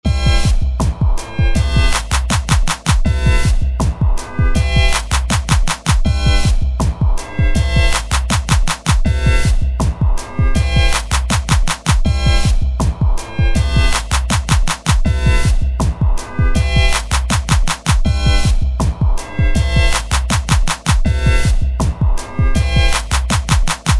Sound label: The Beat